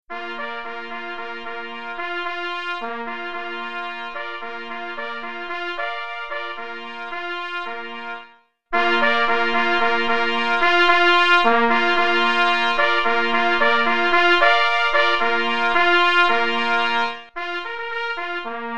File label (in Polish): na 3 plesy; Cz. Trybus; W lowisku